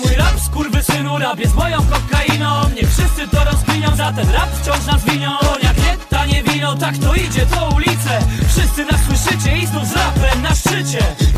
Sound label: applause